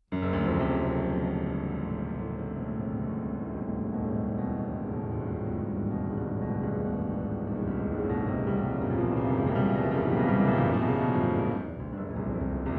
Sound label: classica